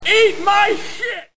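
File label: toll booth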